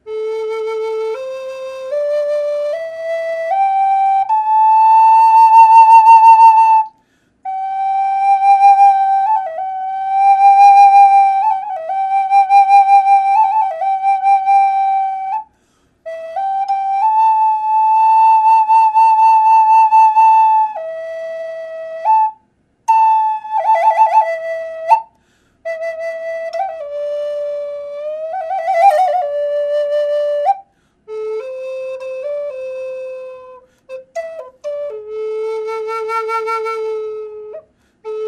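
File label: natural, flute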